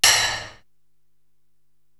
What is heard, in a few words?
SD Metal Striker, Korg TR-Rack drum machine